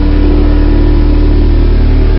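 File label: garage ambience; hl2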